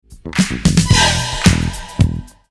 Stab

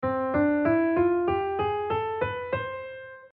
6th dim major scale

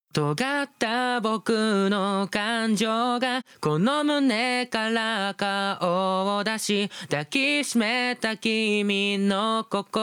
after vocal no reverb

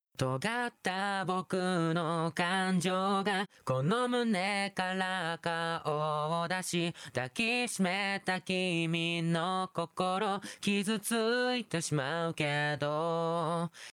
chorus no reverb

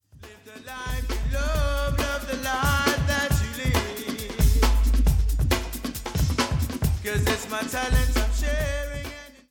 Beat

